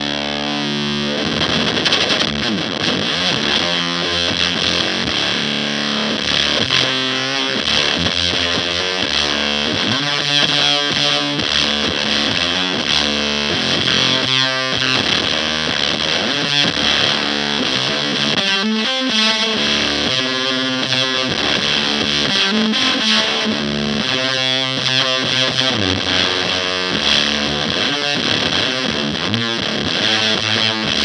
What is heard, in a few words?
Evil Filter Fuzz